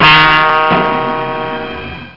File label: BAHHH